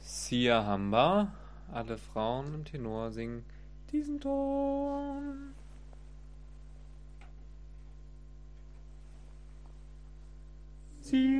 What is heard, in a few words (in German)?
Tenor; Siahamba